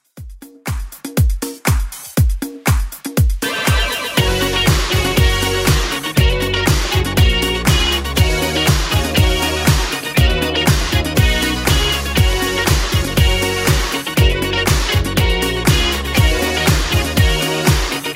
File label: 80s Club Edit 120bpm